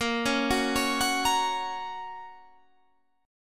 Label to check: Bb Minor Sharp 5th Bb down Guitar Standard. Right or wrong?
Right